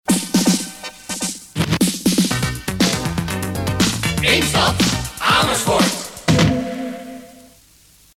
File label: disco; amersfoort; radiopiraten; Eemstad